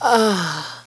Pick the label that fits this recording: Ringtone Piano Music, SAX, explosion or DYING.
DYING